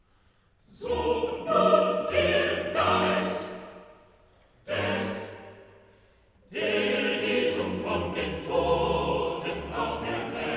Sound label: Motet; So nun der Geist; Bach; BWV 227; Jesu, meine Freude